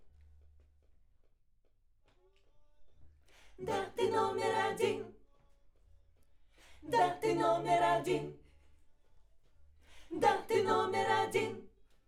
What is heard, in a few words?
Backs AKG LEFT